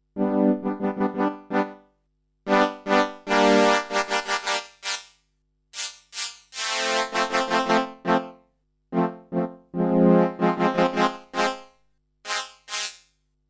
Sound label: ASR-10 Transwaves PWM Synth